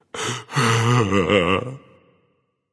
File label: boo hoo; smite